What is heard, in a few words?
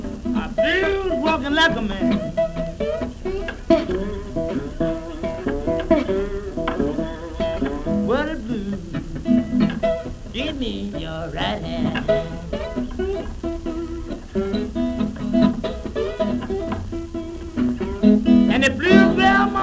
blues